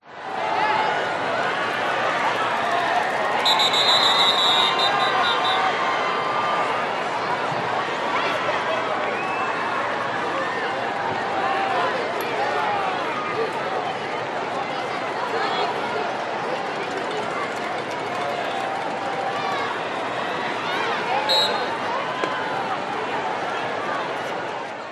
american football match crowd noise shouts ref whistle